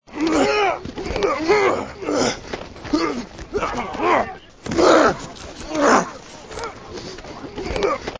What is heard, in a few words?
Football Grunts And Hits